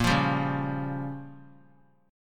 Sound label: A sharp Minor 6th; strummed; JGuitar